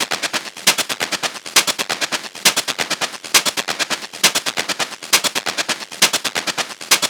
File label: Perc Loop 135, Techno